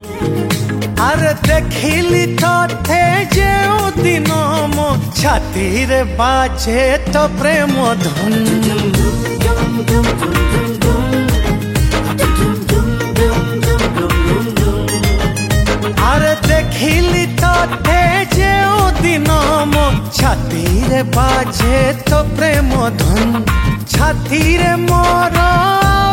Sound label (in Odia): Prema Dhun New Romantic Song